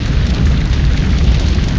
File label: fire loop